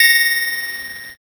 handbell

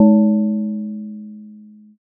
gong

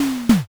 door close

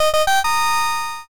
short fanfare

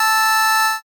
organ

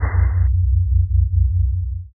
horror title

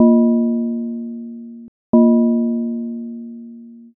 gong clock

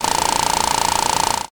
projector

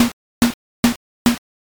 tatami step

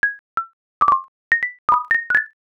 raindrop

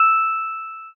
pon

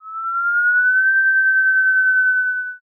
kettle

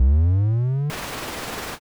machine start

